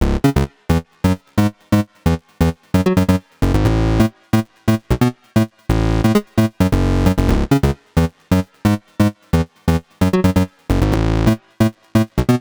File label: 132 House Bass C#min; Dance DJ; Digidesign; Pro Tools; Pro Tools Essential Loops